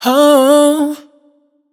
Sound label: riff hoa Am wet, Vocal Sample Pack, Raw Vocals, Wet Vocals; Slate Digital Omnivox Vocal Sample Pack